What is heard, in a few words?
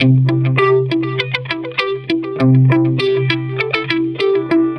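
Moon, guitar, rock pop; сэмпл гитары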